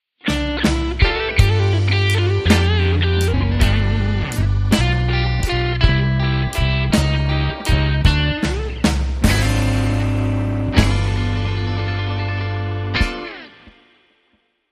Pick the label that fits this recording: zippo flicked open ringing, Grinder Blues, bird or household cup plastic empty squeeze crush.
Grinder Blues